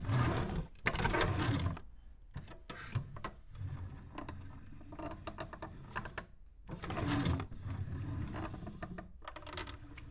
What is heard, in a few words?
Pusing Old Wooden Crate